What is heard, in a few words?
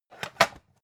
pan stack hard